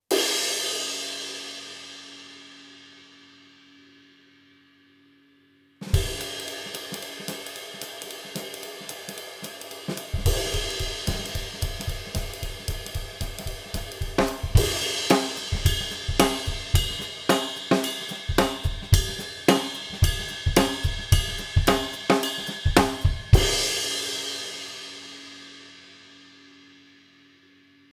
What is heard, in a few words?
zildjian bebop ride